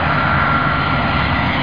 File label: jet; Meteor